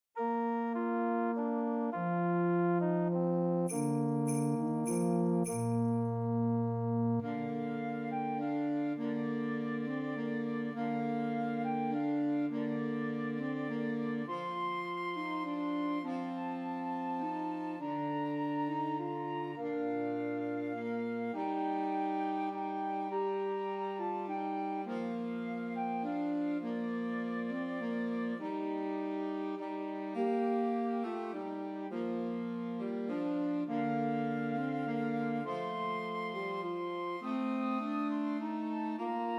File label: Trios for Flute Alto Sax and Tenor Sax; Christmas; Silent Night